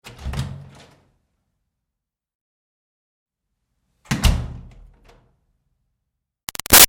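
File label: Door Wood Church Basement Room Open Close Medium; Miscellaneous Doors; BigRoomSound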